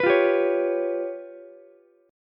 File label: C sharp 7th Flat 5th C sharp down Ukulele; C#7b5; strummed; JGuitar